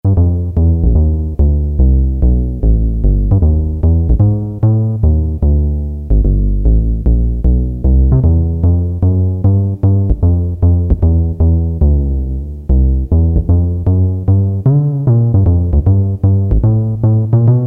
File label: UPRIGHT BASS, Roland Jupiter-8, Factory patches